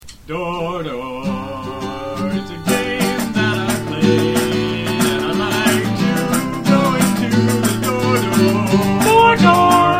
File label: 10 second song about door door; shortsongs